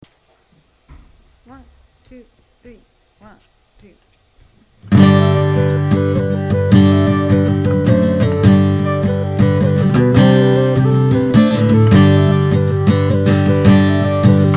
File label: Guitar; Drops Of Brandy